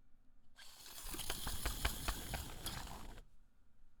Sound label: measuring tape